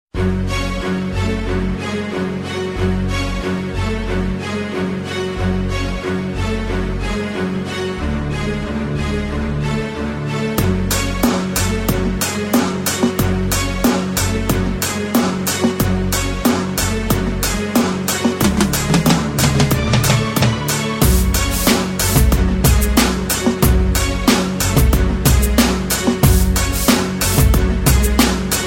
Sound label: hip hop beat